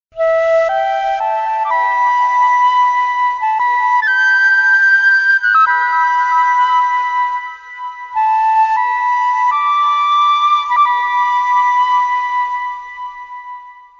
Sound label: flute; Okami